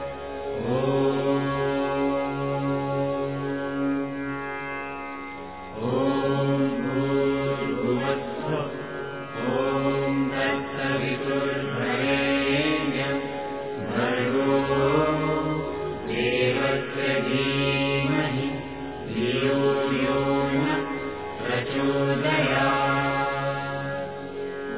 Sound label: gayatri; SLOKAS